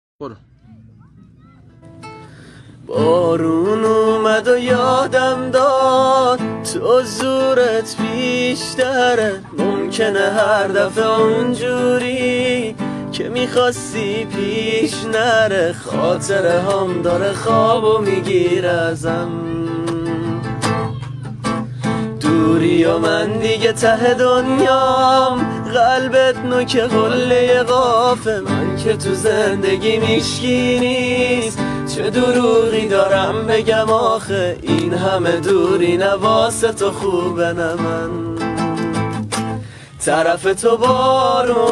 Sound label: Guitar